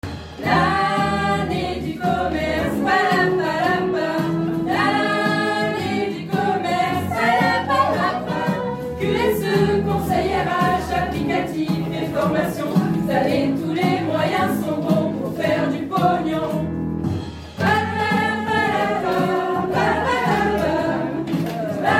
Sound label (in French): chanson; marseille